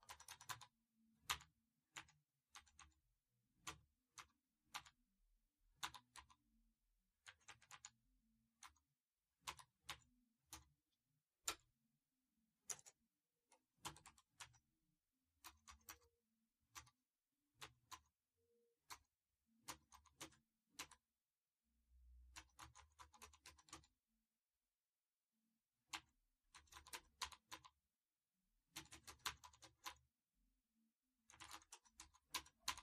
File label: Slow Single Key Typing